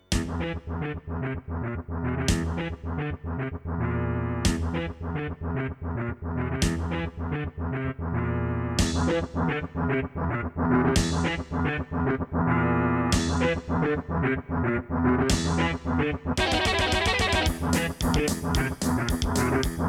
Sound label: AWE64 Gold